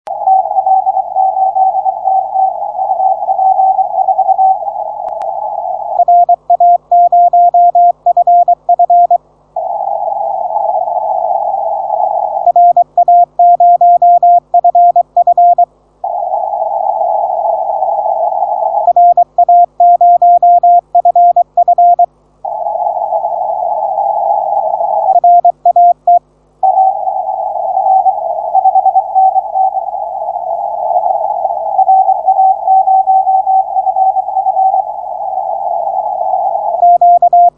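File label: 160m, signals on 160m band; TL8TT with me; RAØFF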